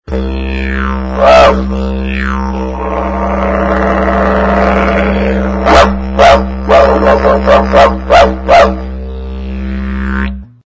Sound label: growl bark